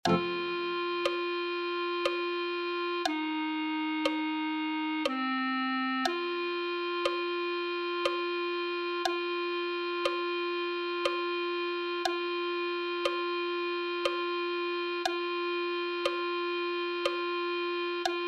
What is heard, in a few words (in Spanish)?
clarinet1